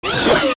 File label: Hit; Dodongo; zelda; OOT